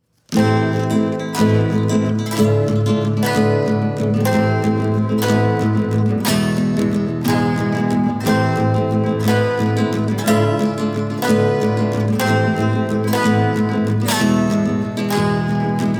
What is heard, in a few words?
Western Theme